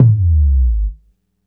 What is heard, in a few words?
NRG Percussion, Trance; Drums; Sonic Foundry (Sony Creative Software); Trance NRG VOL-1